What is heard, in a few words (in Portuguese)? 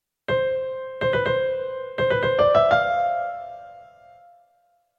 polifonia